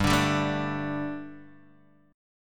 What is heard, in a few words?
G Suspended 2nd, Guitar Standard; strummed; 3,5,5,2,3,x